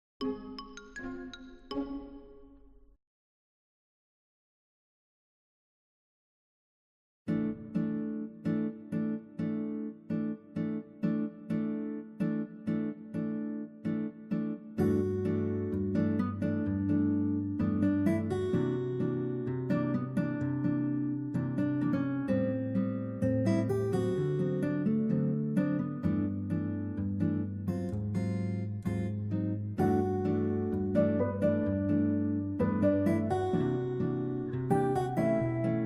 Orchestral Remix; Animal Crossing

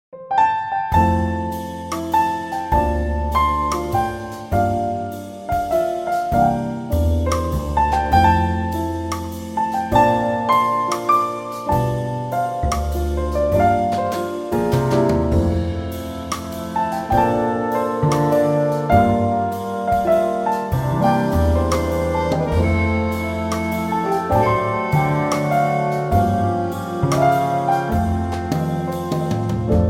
jazz remix; Animal Crossing

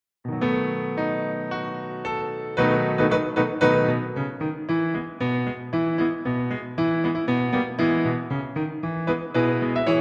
Piano; Super Mario Bros. Wonder

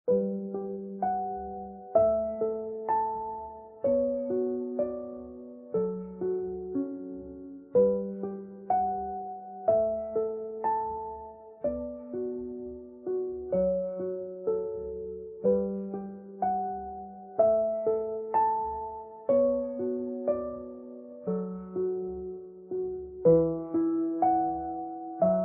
Relaxing Piano cover; POKÉMON SLEEP

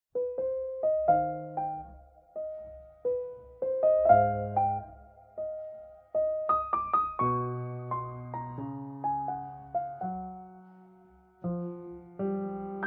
Piano cover; ANIMAL CROSSING： New Leaf

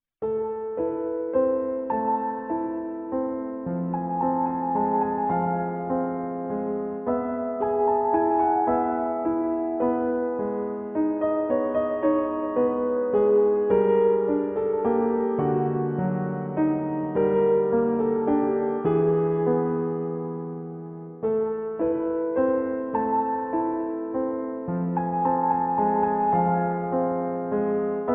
Someone Playing Piano, Piano Cover; Outlast

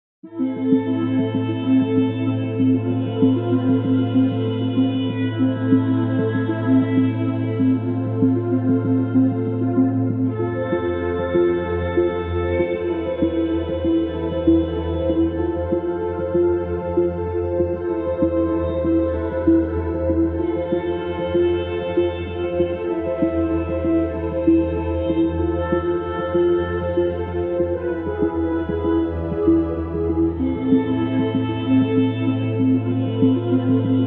RPG Dark Theme Mermaid Music Background Music

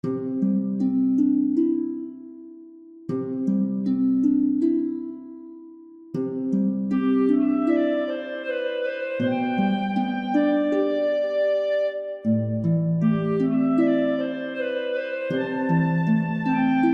orchestral cover; Super Mario Galaxy

